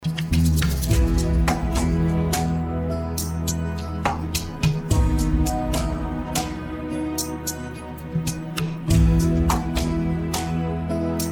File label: Karaoke Song